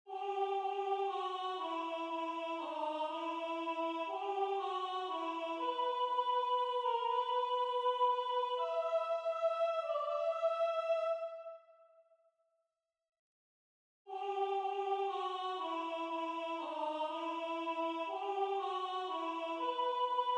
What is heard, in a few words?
Mezzo Soprano; Weicht, ihr Trauergeister; Buxtehude; Dietrich Buxtehude